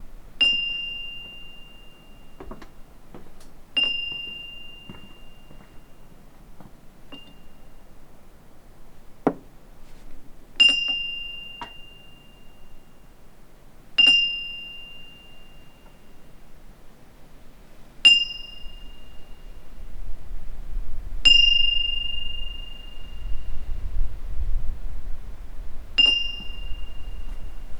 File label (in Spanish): Campanillas; Mapa Sonoro De Ibias